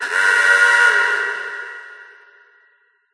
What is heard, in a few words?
Klaxxon Close; Geode Tear